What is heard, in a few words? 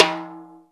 TIMBL LO; Back In Time Records - Big Bang